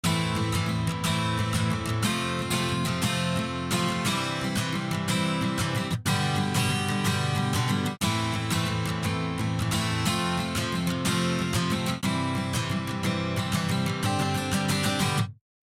Guitar Strumming - Steel Guitar; Steel Guitar 2

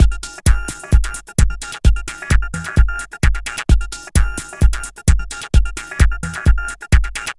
LF Max Punch Beat Extra Subs

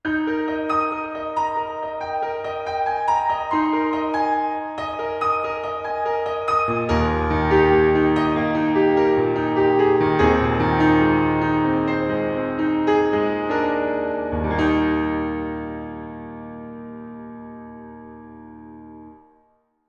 Reverb - Piano - Tiny Verb; CRTIV Reverb

